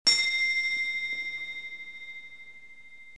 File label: collect